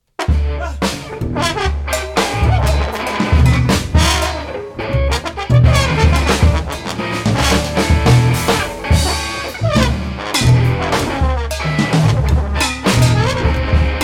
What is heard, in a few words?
jingle; gutter music